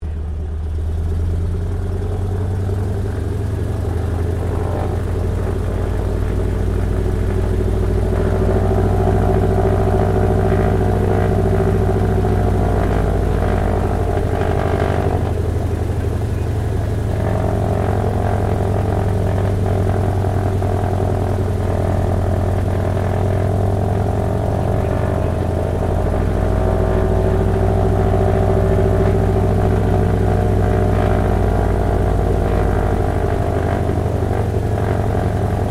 AIRCRAFT BOEING PT-17 STEARMAN PREFLIGHT INTERIOR, Stearman Trainer; 1939; Airplanes; Vehicles